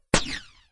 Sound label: laser submachine shot